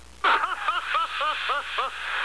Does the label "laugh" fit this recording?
Yes